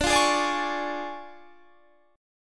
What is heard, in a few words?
D Minor Major 7th Flat 5th, D, Guitar Standard; DmM7b5 strummed; JGuitar